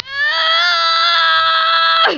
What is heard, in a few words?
explode